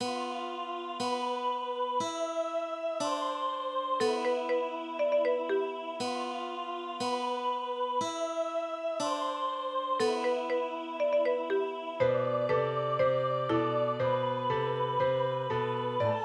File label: Marimba; Praise The Marimba Gods; amateur classist